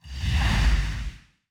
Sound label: wind attack